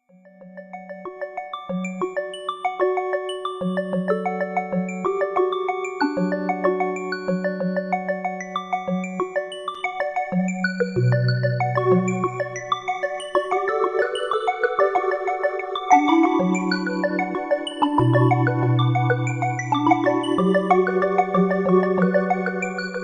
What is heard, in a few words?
string ensemble bells; jurgen Haible; triple chorus; synth